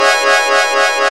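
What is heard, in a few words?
synth riff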